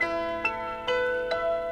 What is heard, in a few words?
keys, jazz loops